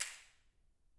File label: hall impulse; 講堂1; プロジェクトA